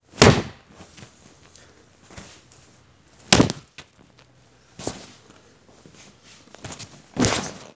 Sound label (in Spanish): Caida